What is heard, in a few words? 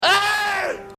ayyyyy